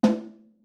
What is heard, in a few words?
snare no snare fff